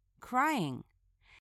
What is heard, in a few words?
crying